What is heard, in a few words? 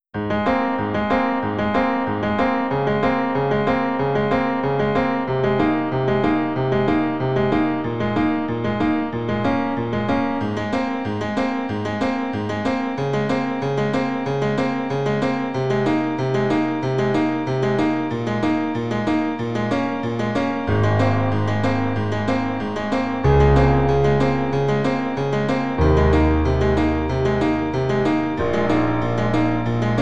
piano